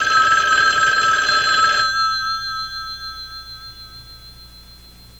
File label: Universal Hollywood Phone Ringtone